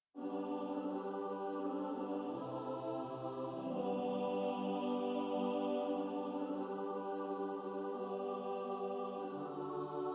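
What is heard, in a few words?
alto, practice music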